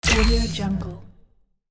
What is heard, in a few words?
hologram appears